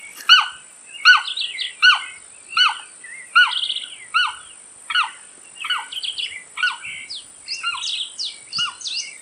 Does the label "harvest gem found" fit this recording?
No